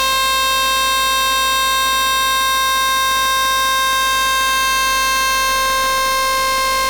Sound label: geosensor computer screen; audacity